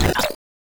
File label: Glitch Sound